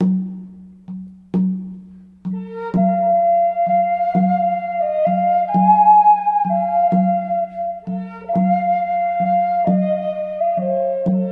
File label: Kiowa Love song, indian flute